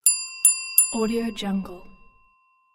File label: ding ding ding